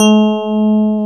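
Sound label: electric piano, hard; MKS20 + EP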